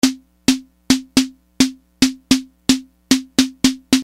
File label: Analog Snare